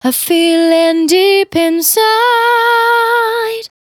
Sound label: Finally 70bpm C, Velvet Vocal; Zero-G